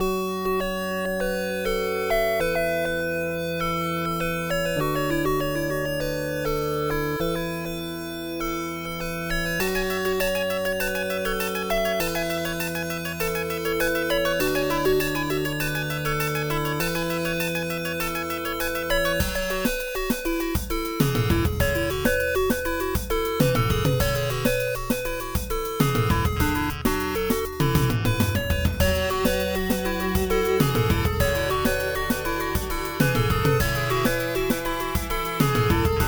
space classic

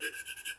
pencil scribble, writing scribbles

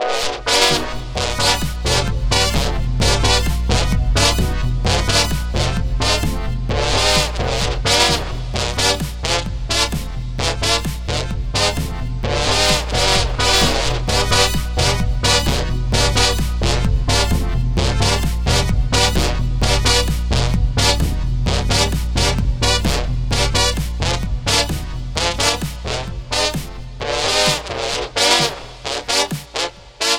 happy akward trumpet

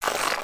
ice

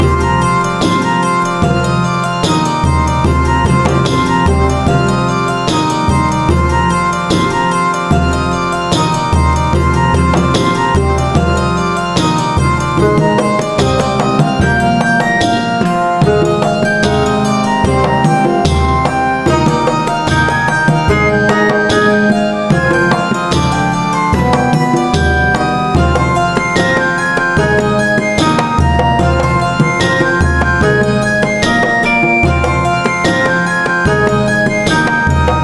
Loop Market Day

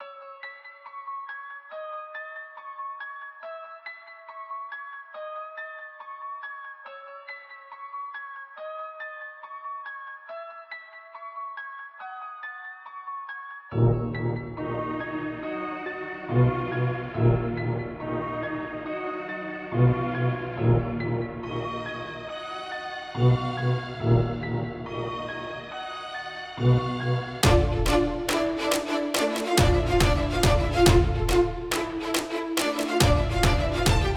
a ceremonial fight intro

